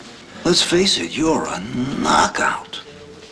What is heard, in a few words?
knockout